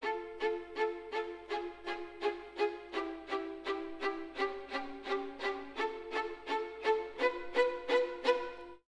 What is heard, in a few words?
Orchestra, Jazz for piano